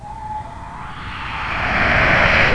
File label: Swooop; MacAddict